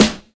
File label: sizzle snare sound g sharp key